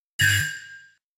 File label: critical chime